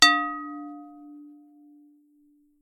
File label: Wood Hit Low Glass Bowl